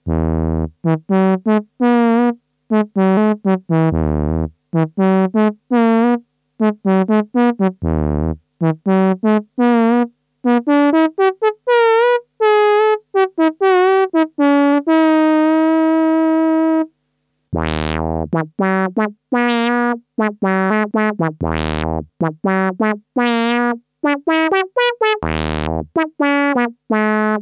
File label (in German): Teisco S100P TROMBONE mit Bend Down Wow